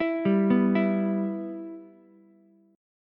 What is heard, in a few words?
E-Minor, Ukulele; arpeggiated; JGuitar